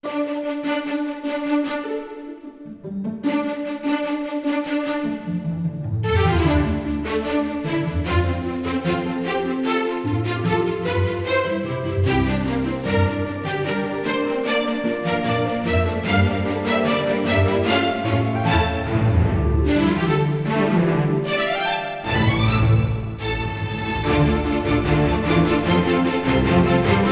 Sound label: no percussion